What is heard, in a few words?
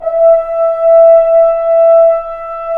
F. HRNS, FRENCH HORNS; Roland - LA Composer vol. 1